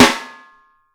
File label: Vintage Snare Drum